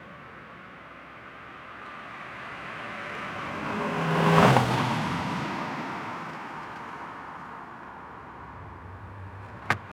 car tyre screech; braking

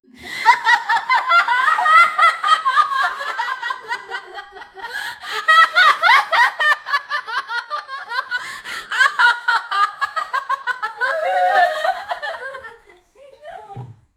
group of girls laughing l, laughter

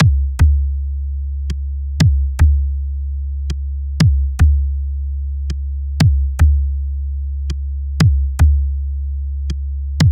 wub wub wurrrp wurrrp vub, dubstep

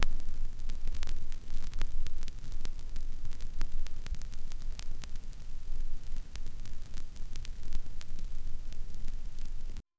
vinyl needle drop onto re

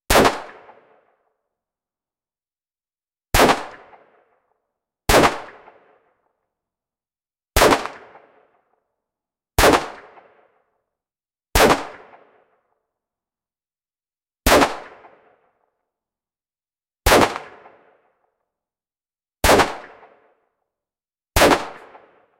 various gunshots; firearms

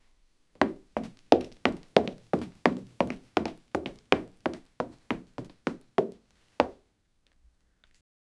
high heels walking sound

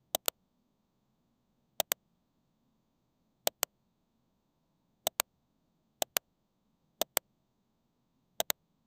ui button click soft

